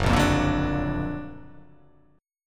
F sharp Augmented Major 7th F sharp

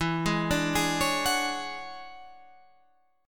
E 13th, Guitar, Standard; arpeggiated; JGuitar

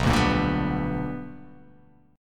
B Minor Major 7th Sharp 5th, chord

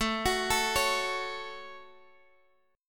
A 6th, Guitar, Drop D; 19,21,19,18,x,x

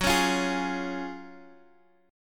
Gb Major 7th Suspended 2nd, down, Guitar, Open F; strummed; JGuitar

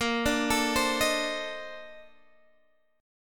Bb Major 11th Bb down Guitar Standard; arpeggiated

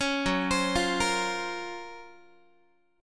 F sharp Major Sharp 11th F sharp, Guitar; F#M#11 Chord; arpeggiated

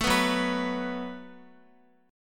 Gb Minor Double Flat 5th, Guitar; Gbmbb5 strummed; x,x,7,4,6,6

